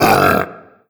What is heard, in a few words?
pain, barnacle; Half Life Alyx